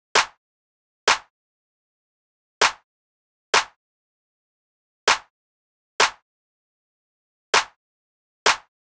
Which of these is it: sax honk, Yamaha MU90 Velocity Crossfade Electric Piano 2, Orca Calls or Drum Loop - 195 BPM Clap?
Drum Loop - 195 BPM Clap